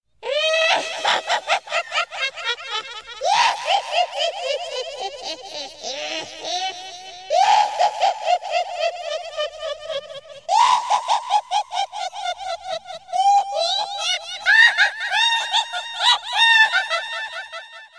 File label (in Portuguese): risada do mal